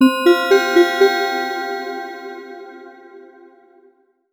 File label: electronic notification